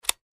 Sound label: button click; launchiine